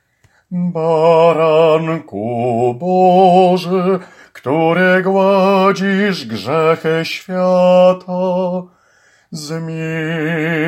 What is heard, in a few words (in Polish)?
SOPRAN